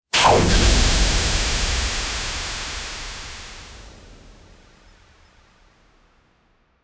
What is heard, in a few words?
magic light attack